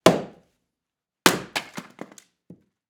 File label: wood chop axe hit; nature